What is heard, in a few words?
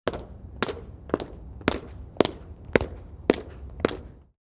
Sidewalk Sound Effects